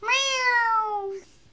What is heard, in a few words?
ouch; rapidragdoll; foxie